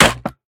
riffle drop wood; STALKER; IWP

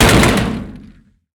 riffle drop metal thin; STALKER; IWP

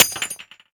shell heavy metal; STALKER; IWP

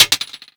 Shell 9mm metal; material; STALKER; IWP

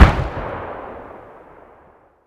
grenade explosion far

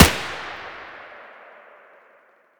mdr muzzle mono distant, weapons

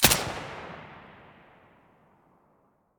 silencer mono

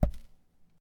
Shell 39mm sand; STALKER; IWP

